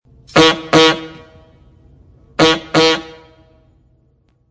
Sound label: Taxi Horn; ring tones